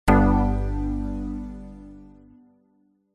multimedia game sound bold warm 70s retro synth positive end complete